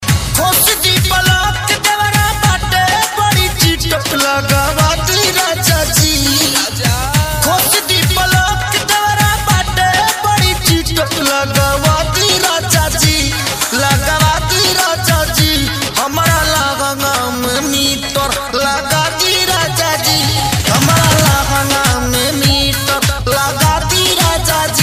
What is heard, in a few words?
BHOJPURI RINGTONE